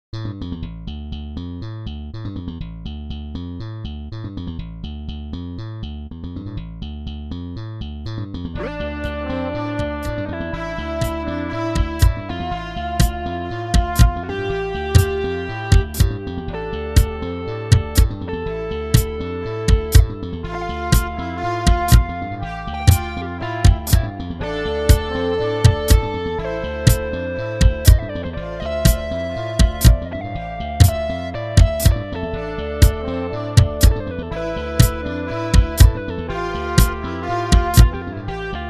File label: MIDI blues